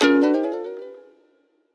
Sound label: gambler win; gambling; Colin The Cat; wazdan; ultrafast